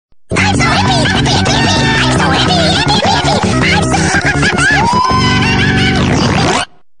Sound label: happy phone ringtone